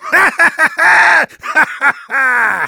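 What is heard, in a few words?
laugh happy, German; Heavy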